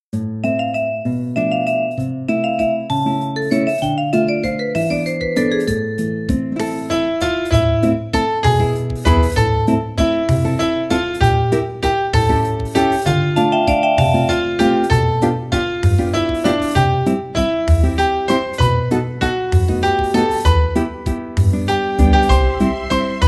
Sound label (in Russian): музыка